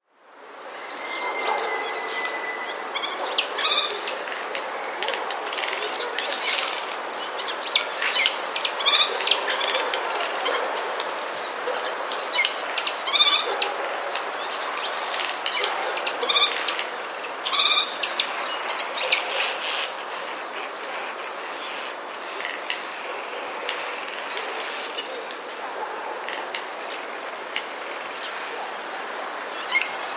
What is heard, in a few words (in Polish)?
sojki